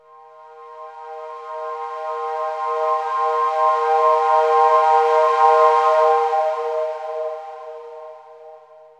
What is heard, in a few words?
synth pad ambient 160 C; Sphere